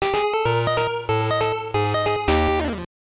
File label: Jingle; dejiko no mahjong party; gbc